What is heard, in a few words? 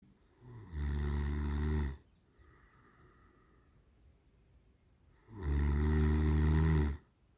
human snoring ronco